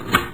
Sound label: key insert